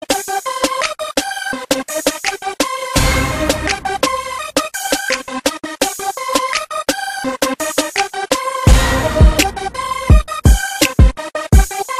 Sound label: hip hop beat